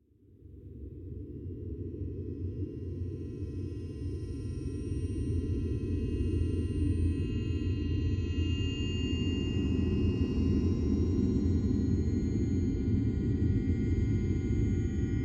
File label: Wind Deep Rising With Overtones; Hollywood Edge; sound designer's toolkit v2